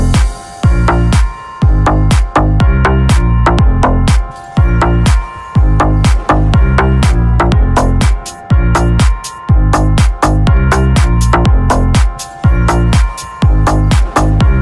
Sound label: English Ringtone